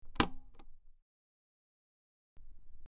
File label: Button Large Button P, switch clicks